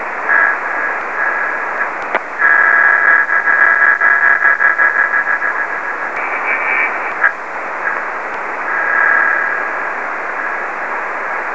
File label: 4k, High Speed CW Meteor Scatter